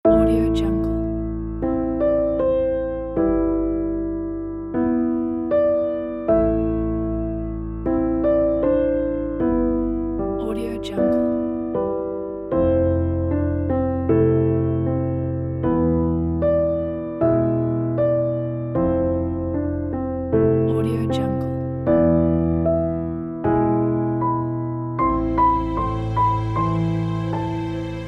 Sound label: sad and dark soft piano